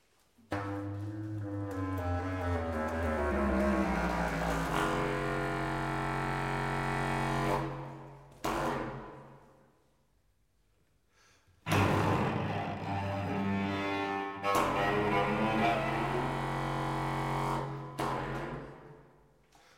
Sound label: trio